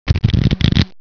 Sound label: shuffle